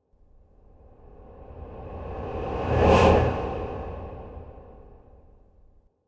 Whoosh Transition Vocal Elements Orchestral Slow Build; Trailer Horror; Whooshes; Sound Design